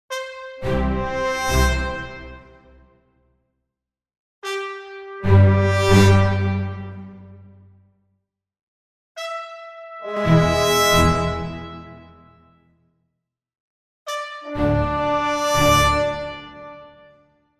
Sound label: Orchestral Jingle Neutral